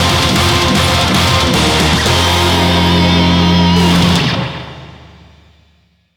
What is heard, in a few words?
Bgm Reach